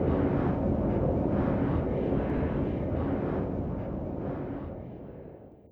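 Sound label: Booster Interior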